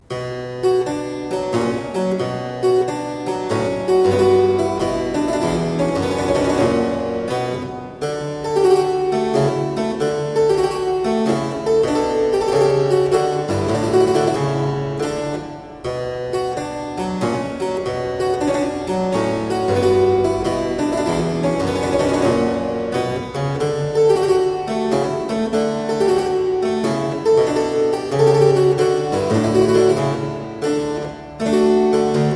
Suite in C Minor - Sarabande, Keyboard Music, spoken; William Croft